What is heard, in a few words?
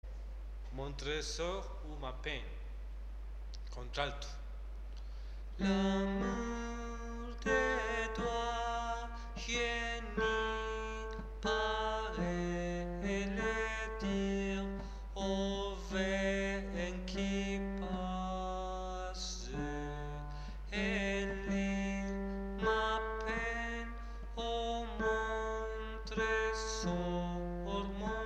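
alto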